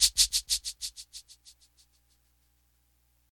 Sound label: big shaker one shot d key, shaker sound tuned to the D note. Loudest frequency: 8810